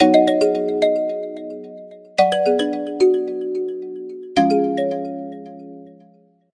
ethereal ringtone

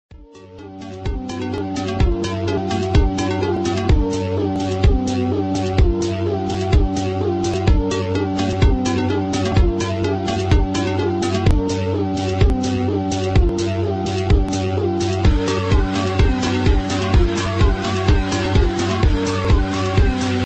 pop ringtone

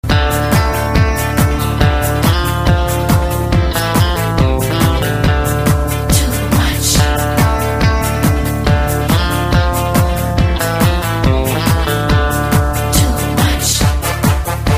Tamil tone ringtone

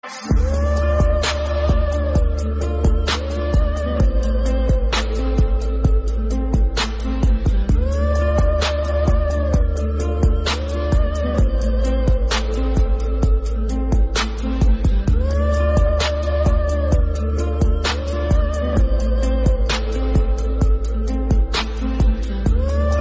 game music ringtone; blue whale

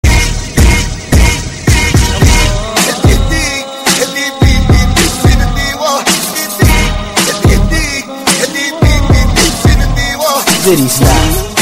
arabic allah akbar ringtone

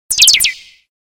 tweeters sms ringtone

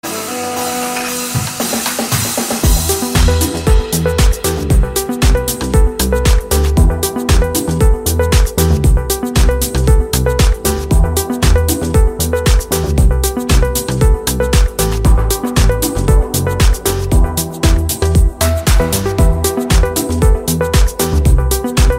iphone x romantic ringtone

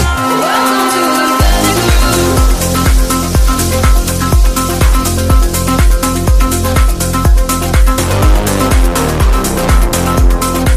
hot electro ringtone

